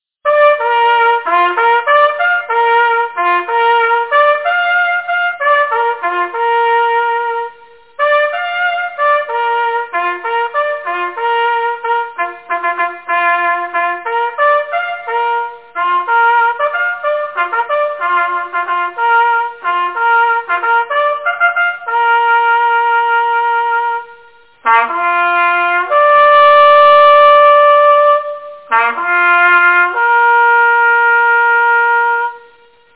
sommerbukken, jagthorn

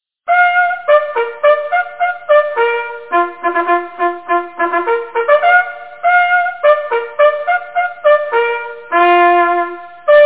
kragen, jagthorn

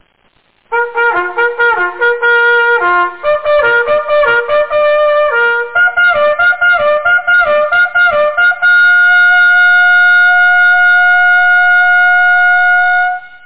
hallali, jagthorn

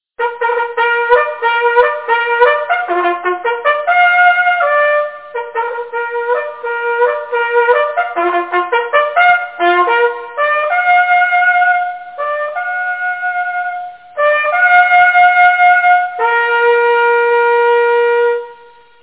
opbrud til jagt, jagthorn; Askov Malt Jagtforening

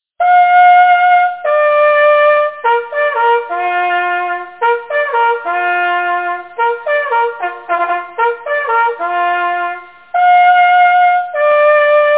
kronhjorten, jagthorn

